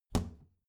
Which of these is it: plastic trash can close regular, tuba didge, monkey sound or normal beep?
plastic trash can close regular